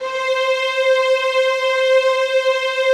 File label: PAD, ATMO PADS; Best Service; XX Large